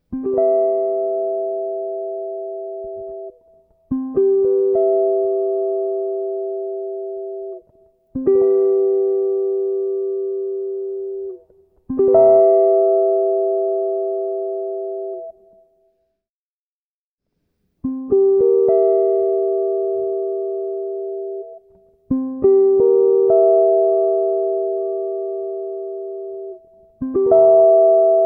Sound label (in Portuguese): Campo Harmonico Maior; Jazz Harmonia